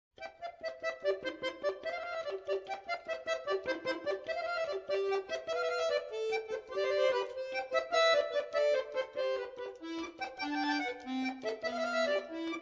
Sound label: Sonate C-Dur; D.Scarlatti